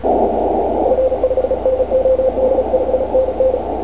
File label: cw